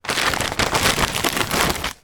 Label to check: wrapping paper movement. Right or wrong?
Right